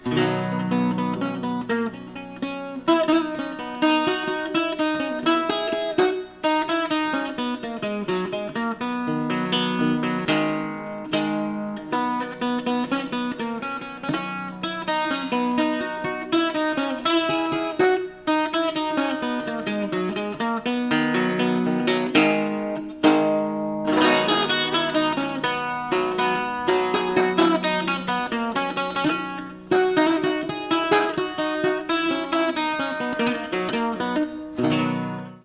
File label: guittar